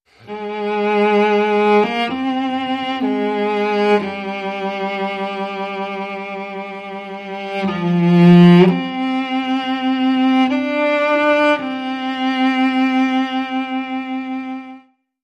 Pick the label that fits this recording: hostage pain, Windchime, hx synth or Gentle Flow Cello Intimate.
Gentle Flow Cello Intimate